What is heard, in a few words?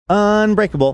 singing unbreakable